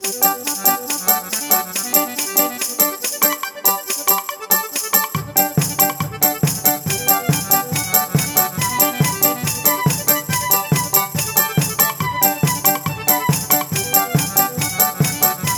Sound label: Russian Fest Loop